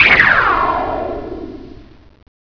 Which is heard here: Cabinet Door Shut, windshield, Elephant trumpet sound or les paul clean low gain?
windshield